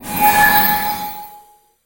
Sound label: Magic Shine Light Spell